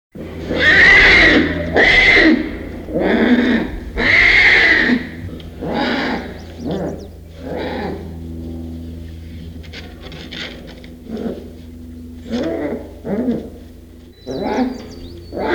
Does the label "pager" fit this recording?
No